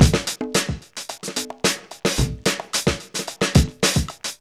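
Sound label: 110 bpm, Funky loops; Zero-G; Funky Elements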